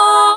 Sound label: Vocal Oneshots; Vengeance Samples; Vocals